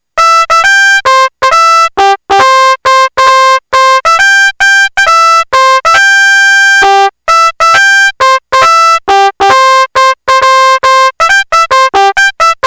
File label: bugle call midi C; patriotic